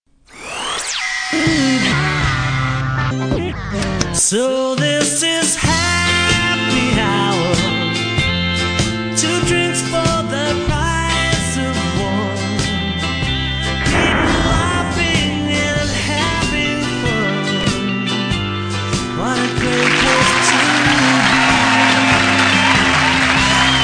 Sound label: Opening Credits; Happy Hour